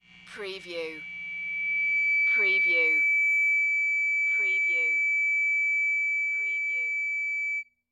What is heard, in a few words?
Guitar Feedback